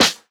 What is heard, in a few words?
Snare, JUNGLE BREAKS; ORIGINAL JUNGLE BREAKS; JBK DRUM SOUNDS; JBK SNARES